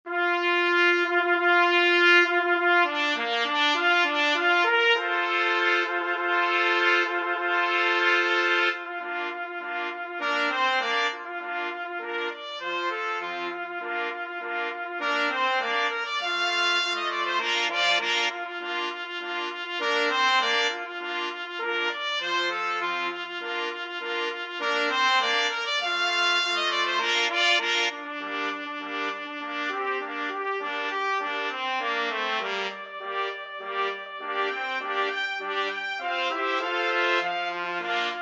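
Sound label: 4 trumpets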